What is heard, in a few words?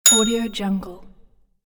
hotel reception desk bell